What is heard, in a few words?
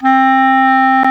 Clarinet; CMI